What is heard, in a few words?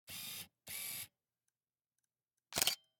Camera, Pentax KS2, DSLR, electronic, mechanical, focus, out in, snapshot, quick; Eiravaein Works; Vaeyan IV